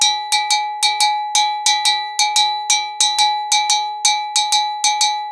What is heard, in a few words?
ETHNO EXTRA 90; ethno2